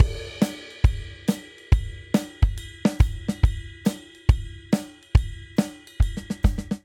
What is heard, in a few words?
Swing Jazz Outro 140 bpm; MIDI Clips; Ableton; Factory Packs; Drum Booth